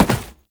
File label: Step Glass, Foley; Warframe; Oraxia